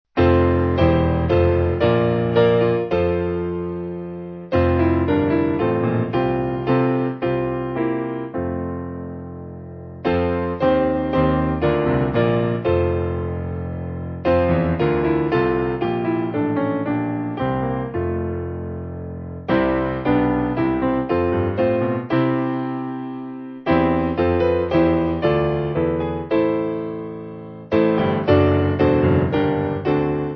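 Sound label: Piano, Music Backing Files for Churches; Now Thank We; Nun Danket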